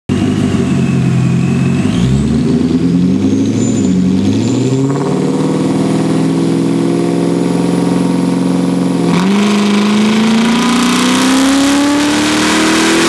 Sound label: Dyno Run